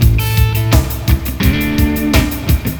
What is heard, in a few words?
GTR